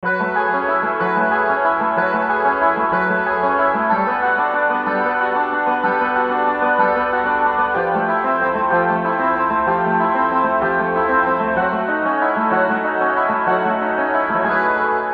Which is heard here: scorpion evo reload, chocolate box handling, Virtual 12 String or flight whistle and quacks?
Virtual 12 String